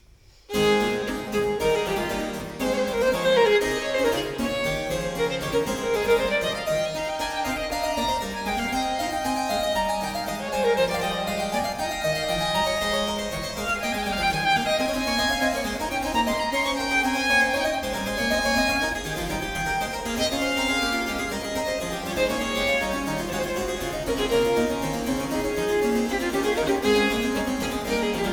Sonata No. 2 in A Major, Presto, Violin and Harpsichord; BWV 1015; Bach; J.S. Bach